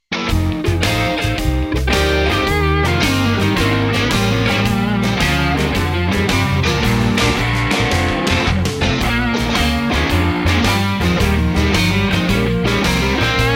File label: Bb BLUES SWING; chapter 5 using the whole tone scale in improvisation; flutist